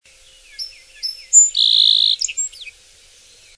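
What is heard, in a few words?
Song Sparrow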